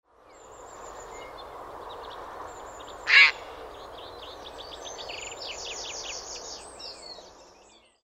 grey heron

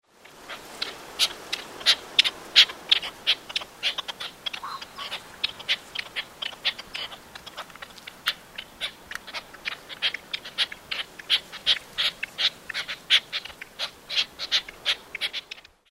red legged partridge

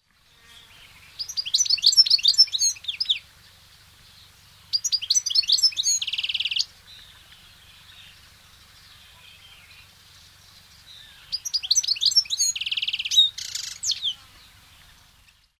goldfinch

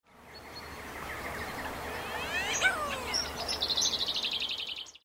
pochard

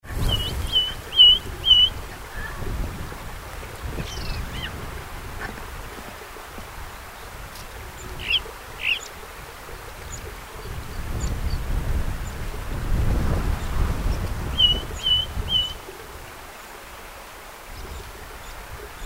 ring ouzel

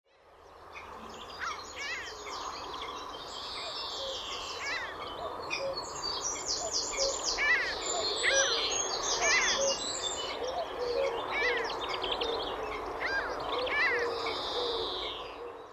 mediterranean gull

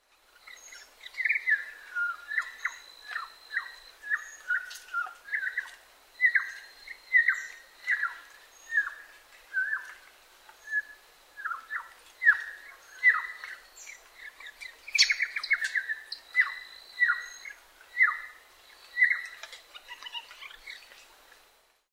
barred honeyeater

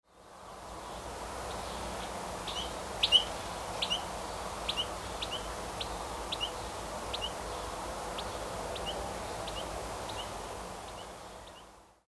goldfinch nocmig

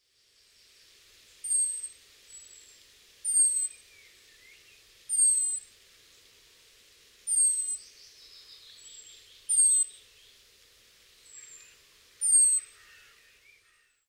song thrush

